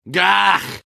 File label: negative vocalization; medic